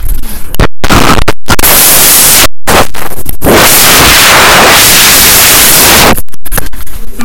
funny loud breathing

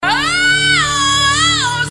ohhhhhhhh

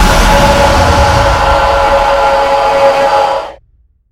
jumpscare sound; one week at ics

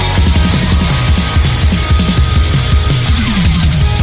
fill infinite

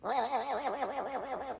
laughing bird

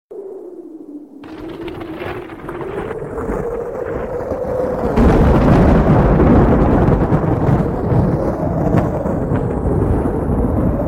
tornado ringtone

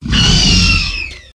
velociraptor medium scream

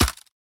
perc

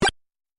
fire flower ball

